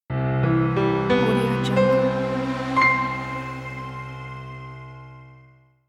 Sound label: bright piano logo